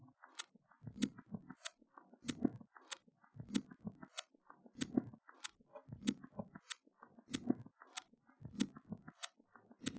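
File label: with drums inst src; SiSEC11; underdetermined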